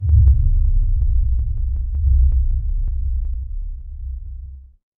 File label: magic scifi shield